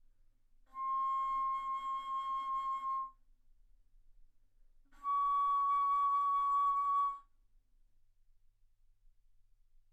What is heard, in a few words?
Bass Flute ff C6 Db6